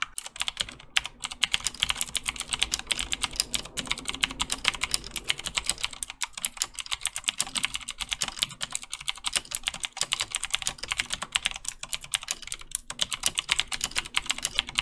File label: Cherry MX Blue